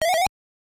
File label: Stomp, Sound effect; SML